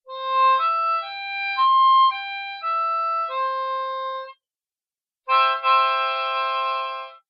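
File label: Arpeggio and C major chord, harmonica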